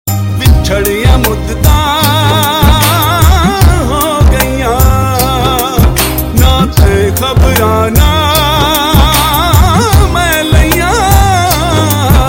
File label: Punjabi Sad Tones